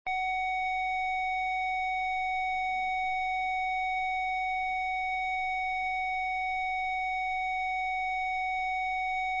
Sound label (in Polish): syrena